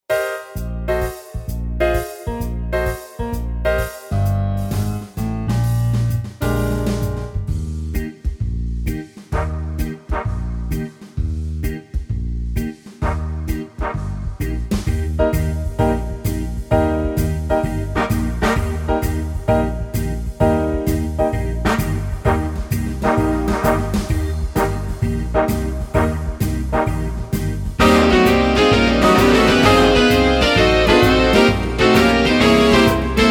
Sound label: big band medium; wersi